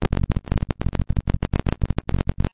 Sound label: broken forcefield; Weird and Interesting Hits